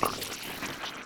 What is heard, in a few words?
Transform Start